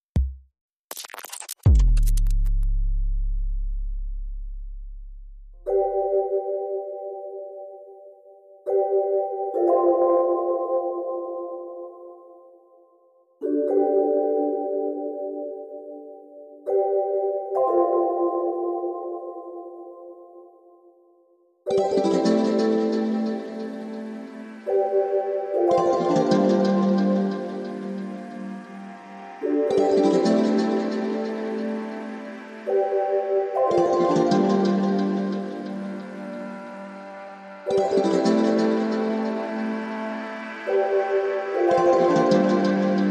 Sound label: No Drums No Bass